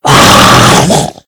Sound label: attack, mummy